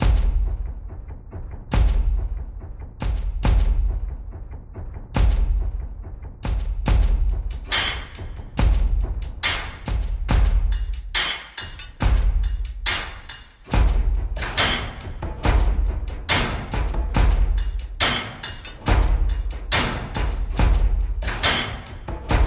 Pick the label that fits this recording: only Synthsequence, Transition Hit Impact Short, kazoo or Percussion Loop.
Percussion Loop